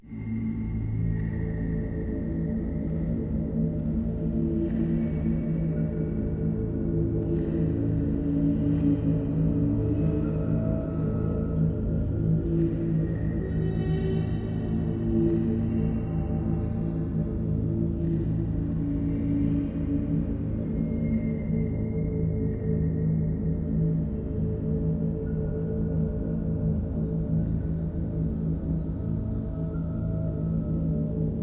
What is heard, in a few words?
bass; survivor; Identity V